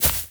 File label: warrior walk grass